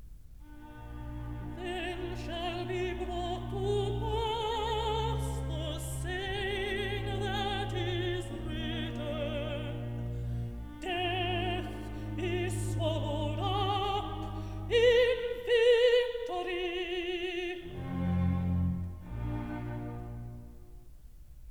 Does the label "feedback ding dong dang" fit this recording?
No